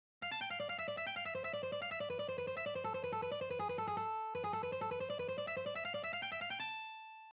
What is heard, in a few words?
Alternate Picking Exercise, guitar